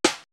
snare, Roland MC-909

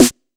Boss DR880 snare; drums

